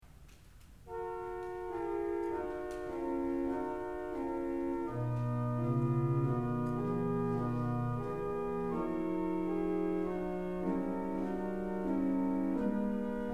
Chorale Prelude, organ

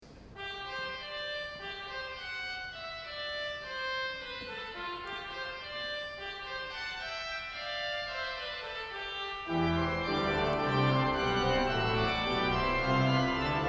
Fanfare For Organ

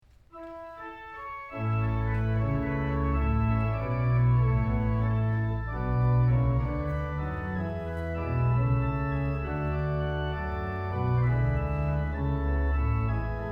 Air With Variations; Stirling

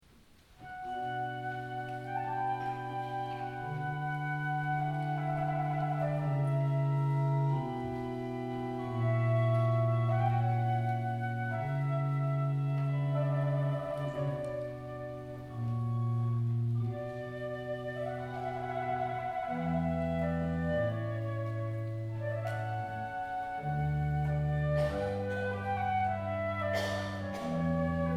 Solo For A German Flute

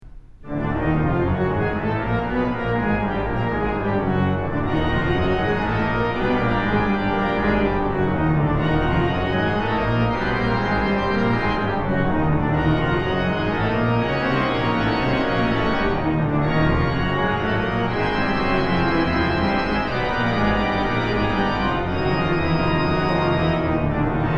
Octaves; Four Concert Etudes; David Briggs

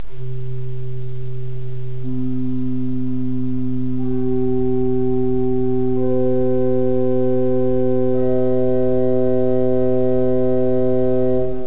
cornet, organ

